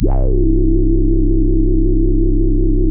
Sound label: Beamier bass; Crowdsourced Sample Pack